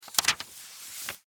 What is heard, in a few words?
Office Book Hard Cover Page Turn Single Long; Offices